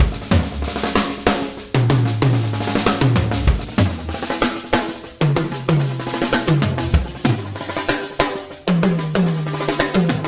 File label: Percussion; Copula